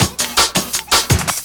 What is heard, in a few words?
Break, Loops; M8